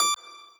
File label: lead d